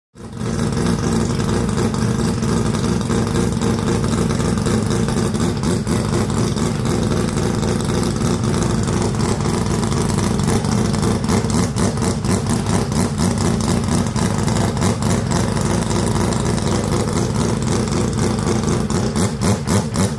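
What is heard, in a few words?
Sports Car Start Idle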